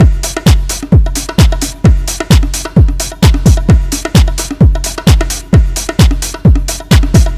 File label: DRM, LOOPS 130, House; Best Service ProSamples vol.10 - House; AKAI